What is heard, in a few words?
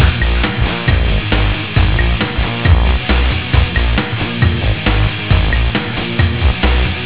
loop